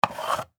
Electric Pencil

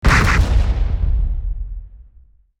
Explosion Black Powder

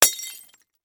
Crash Glass Wine

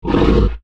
Dinosaur Snarl

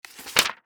Book Hard Cover

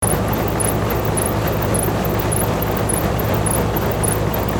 Conveyor Belt

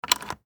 Dead Bolt Unlock

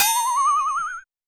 Twang

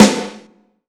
Snare Drum

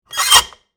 Cargo Bay Door

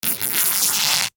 Cloth Tear

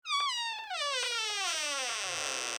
Door Squeak

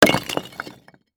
Crash Rock Debris

